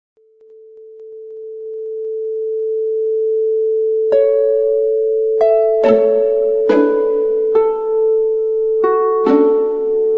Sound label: drone